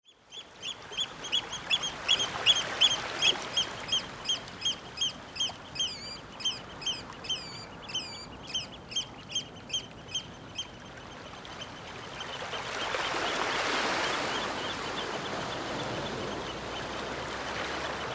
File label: waves on rocky beach, scotland, durness